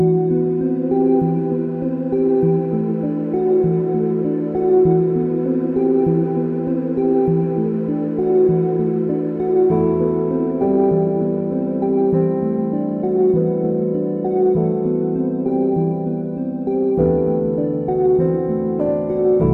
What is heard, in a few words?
BGM field garden; starbites